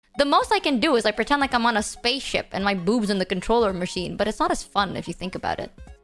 uuh

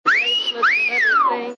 fiti fiu

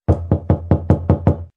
knocking door

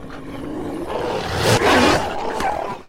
monster lunge

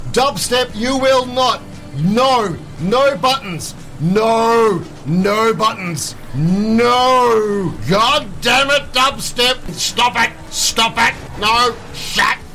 dubstep

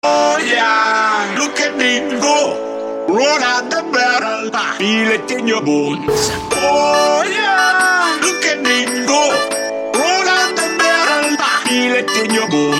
sings a song with text

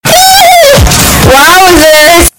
eheh loud

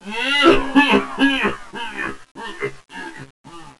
drowns; eggbert

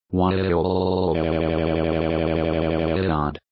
waaaaaaaaaa

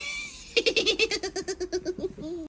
goofy laugh

